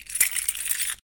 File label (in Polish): klucze zdjecie kajdan